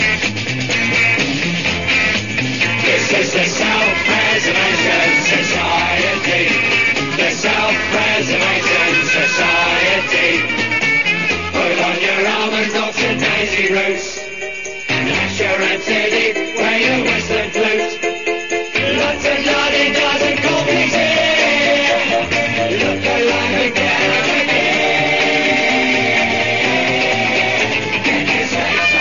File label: sicilian italian tune ringtone